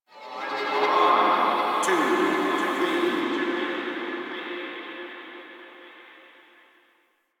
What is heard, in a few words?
Ampthitheatre Echoes; Warframe; Quests; The New War; Ambience; Zariman